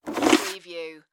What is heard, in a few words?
Fax Machine Remove